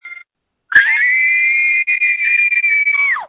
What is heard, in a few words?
Scream